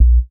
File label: 808s Sub